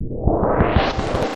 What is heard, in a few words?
Bumpy Noise Transition